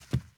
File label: carpet, Footsteps; civ14